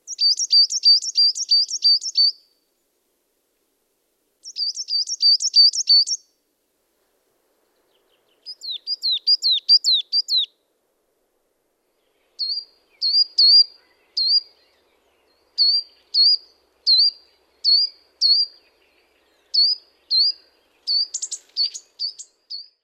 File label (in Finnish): Kuusitiainen; kuusitiainen sirkuttaa kirkkaasti